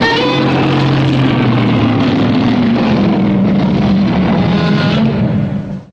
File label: Godzilla SFX